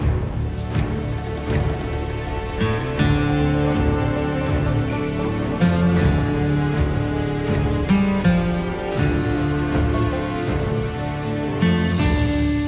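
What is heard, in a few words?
background music preparation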